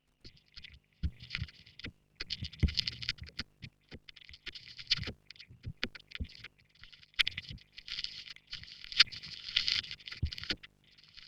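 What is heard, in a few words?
Vocoder pseudo stereo, Schallplattengeräusche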